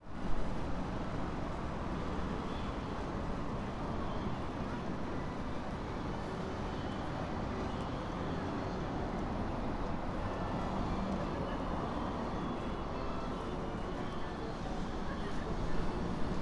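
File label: machinaka koseki bus, Fukushima Soundscape; Machi-naka Square: 11 March, 2025; When I recorded the soundscape of 14:46, March 11, 2025, I heard an inappropriate sound for the time.; Koji Nagahata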